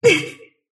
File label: sylph pain; dota; dark willow